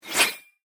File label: Pneumatic Hose, Scifi, Disconnect, Expel; Science, Fiction; Spacecraft